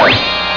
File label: reveal; usa